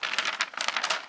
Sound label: Paper Map Fold; SFX Kit; Foley - Misc